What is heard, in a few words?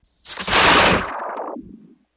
Starship 1 Overhear